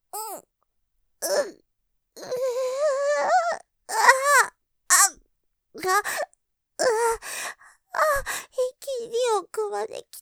喘ぎ; うっ、一気に奥まできたぁ…; ループで楽しむ！シェフの気まぐれ抜き抜きコース; モノラル

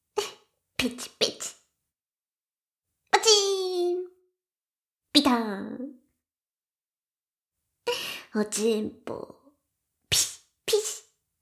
しこしこ音声, ASMR; 援交JK魔法少女マスカリチェリー

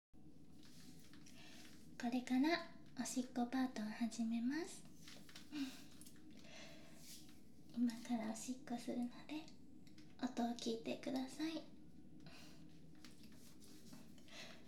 おしっこをダミーヘッドマイクで生録音

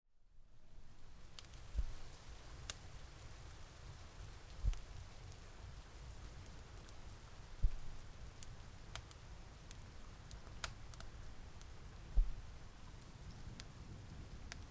すやすや僧侶さん-雨音＋ポンポン-

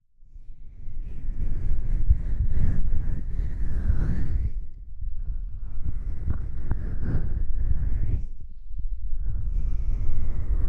医療用シリコン耳で素手耳マッサージ 両耳同時, ASMR

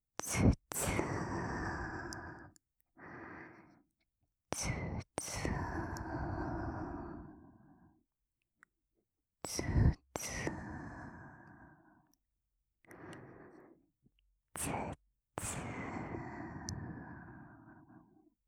つつー

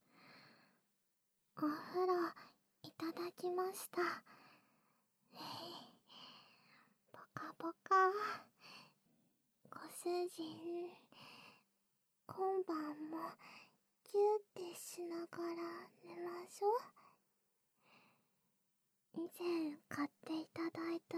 キス ディープキス, ASMR; ティティを押し倒して

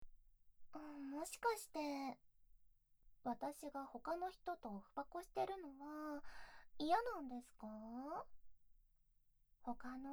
耳舐め, ASMR; 手コキぃ～

